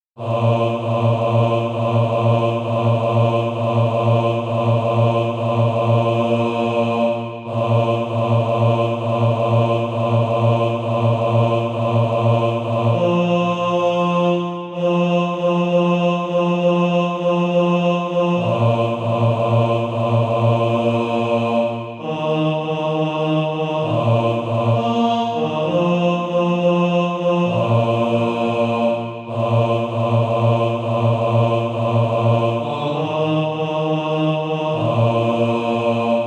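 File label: bass; Dont Forget to Pray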